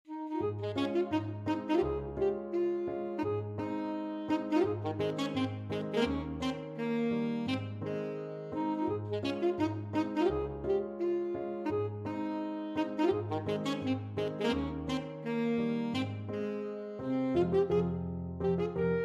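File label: hungarian dance alto saxophone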